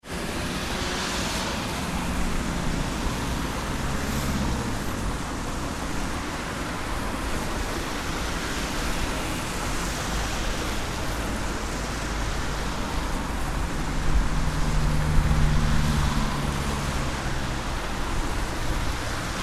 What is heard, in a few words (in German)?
Straßenverkehr Kreuzung; salamisound